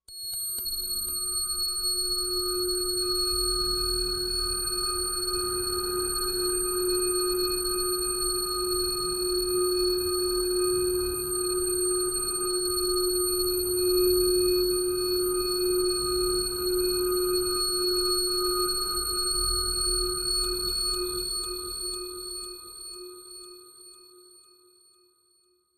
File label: Four Eight Sixteen, alarms